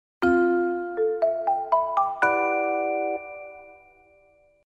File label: say cool msg